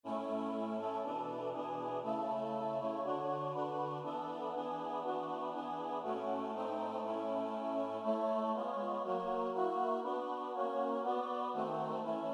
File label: alleluia song tutti, Choral Music